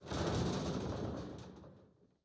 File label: ambient soundscape underground; Soundscape Overhaul